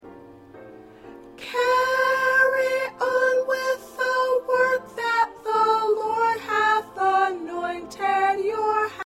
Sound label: Soprano